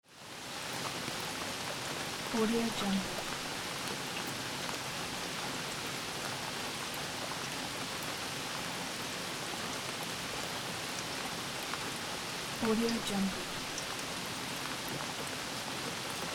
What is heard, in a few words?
heavy rain